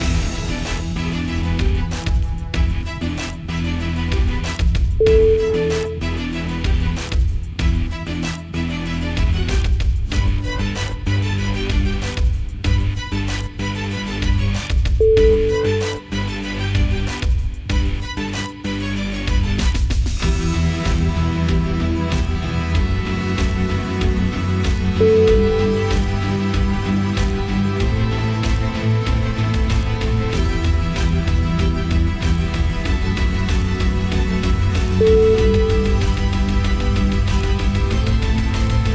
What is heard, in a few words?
hiphop sport opener